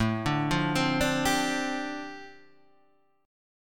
G 7th Suspended 2nd Suspended 4th A

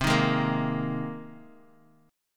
C Minor 7th Sharp 5th C Bass Standard; Cm7#5 strummed; JGuitar

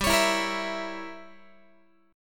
G Diminished 7th, Guitar; Gdim7 strummed; x,15,16,14,14,15

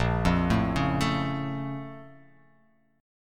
A sharp Minor Major 7th, down; A#mM7 arpeggiated; JGuitar

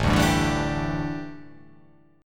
A 7th Suspended 4th, A, down

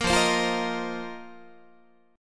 F 7th Suspended 4th F down Guitar; strummed

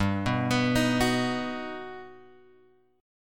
G Augmented Major 7th; G+M7 Chord {3 2 X 4 4 2} arpeggiated

